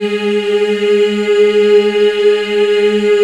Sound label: G#3, Choir